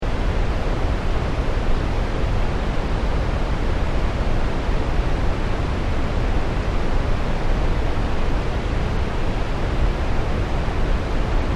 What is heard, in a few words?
Water Waterfall Large Distant Rumble; Nature